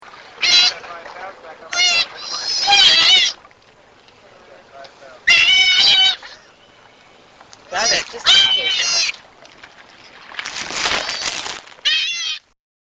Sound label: sea otter pup